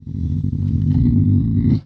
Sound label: sleep